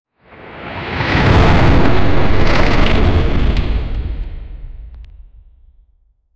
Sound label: dramatic sound whooshing